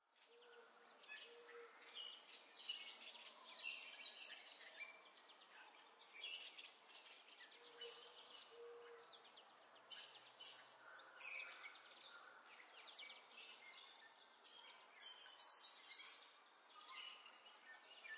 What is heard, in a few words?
Nature Birds, Extremely light birds ambience, almost unnoticeable; Forest